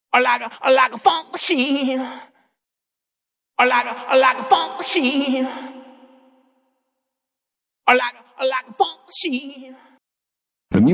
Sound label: DeReverb Test